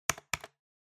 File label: Trackpad Click L Hard Double